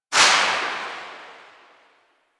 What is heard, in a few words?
Pistol Silenced Tail; Weapons; Warframe; Drifter; Operators